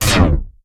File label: Nano Blade